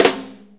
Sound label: 4-4 Snare Flam; 4-4 Drum Loops; Flams; Drum Tools